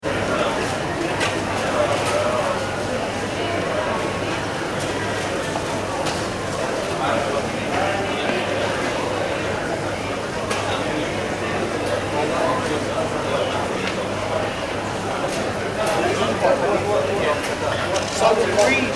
ambience airport heathrow departure lounge; London Heathrow Airport; Airports; McKinneySound

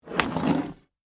Skateboard Tail, Mini, Slide, Wood, Surface; Sports; Skateboarding Sound Effects; SoundEffects-BlastWave

